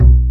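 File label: double bass B-1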